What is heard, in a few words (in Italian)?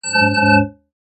ciottoli zeitverz; acque